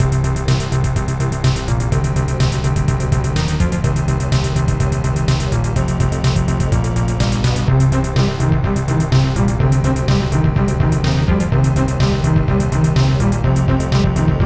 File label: Fast Violins